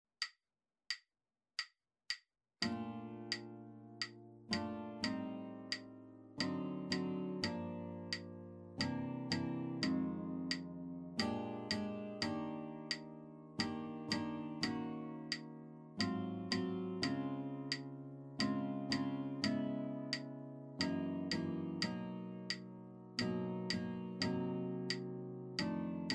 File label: melody playalong